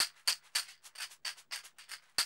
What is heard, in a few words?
110, Classic Drum Loops